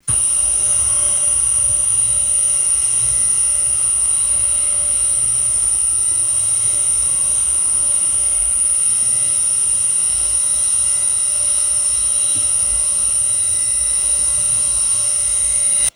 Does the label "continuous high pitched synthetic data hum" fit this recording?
Yes